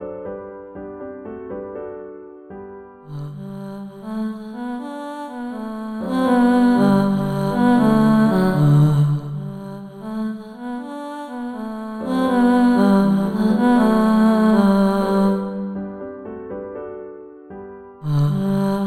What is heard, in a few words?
Three Ships tenor; I Saw Three Ships